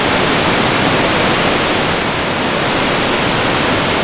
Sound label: waterfalls